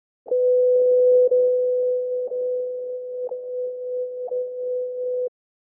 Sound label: wwv 5 mhz; harmonicModel